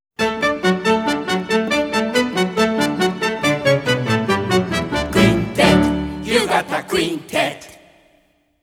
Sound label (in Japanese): ハニホヘトイロハ・ジングル; You Gotta Quintet Songs